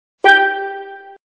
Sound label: G Drum, Casio